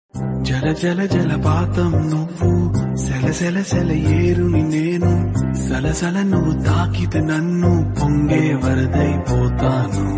Telugu Song Ringtone

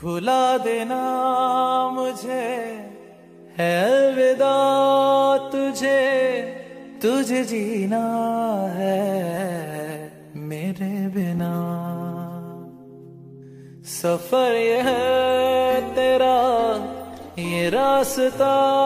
Breakup Song Ringtone

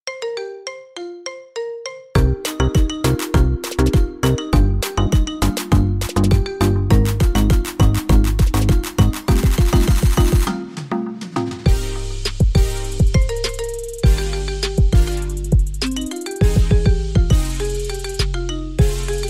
Marimba Remix Ringtone

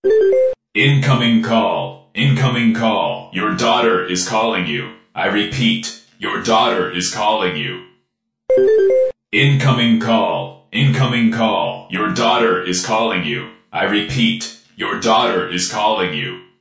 Ringtone Lucu

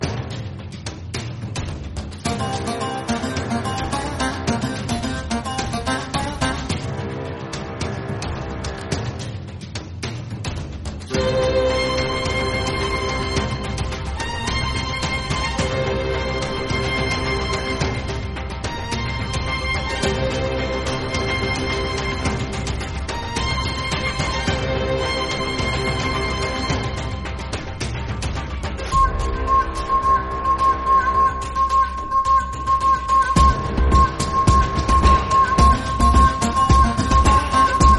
Villain Bgm Ringtone; ringtones; Jailer

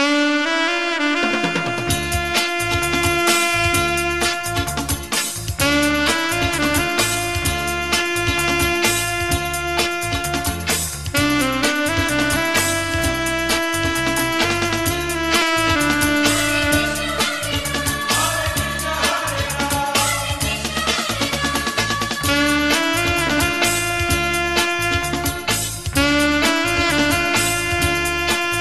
Instrumental

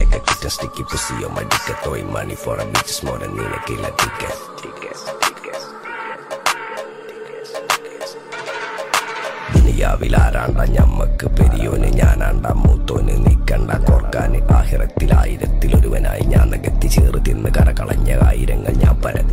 Malayalam Song; ringtones

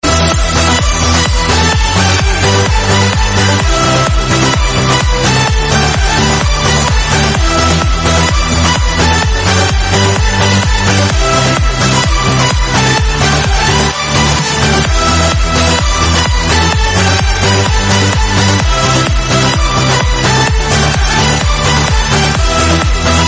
Fast Music Ringtone; ringtones

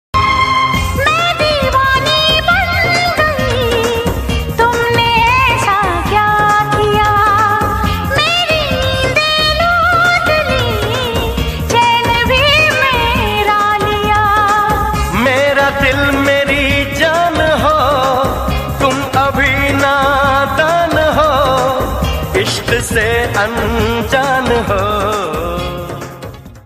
Old Hindi Song

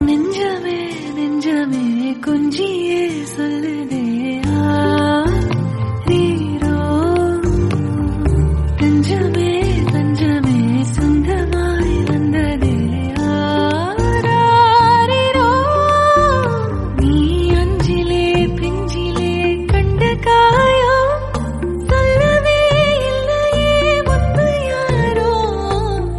Ringtone Female Version

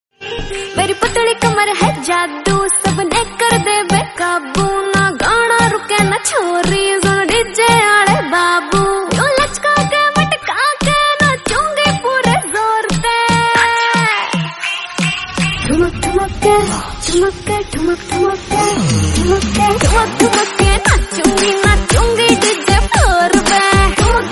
Pahari Song Ringtone

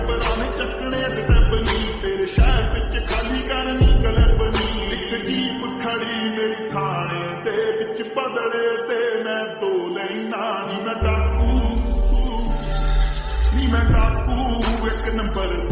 Slow And Reverbed Punjabi Ringtone; ringtones

